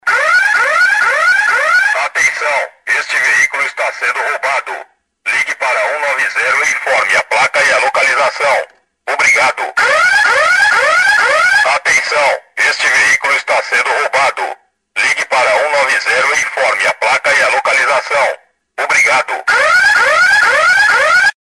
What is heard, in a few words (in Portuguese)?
Alarme Carro Roubado